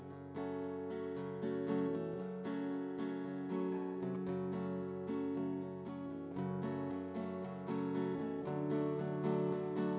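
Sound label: with drums, instantaneous; SiSEC11; underdetermined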